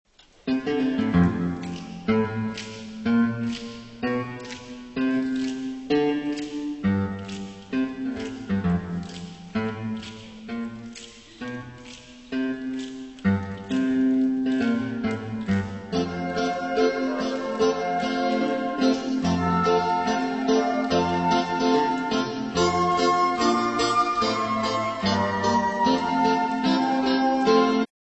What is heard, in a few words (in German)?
Gospel Medley, floetenchor